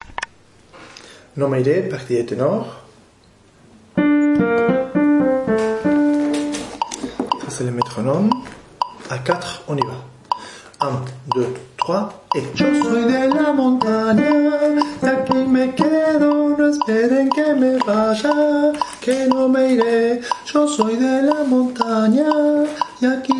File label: Tenor